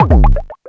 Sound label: Bone Rollercoaster Ground Pound; New Super Mario Bros. Wii